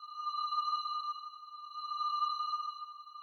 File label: Spool Fragment Idle Loop